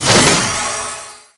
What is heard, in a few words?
Blink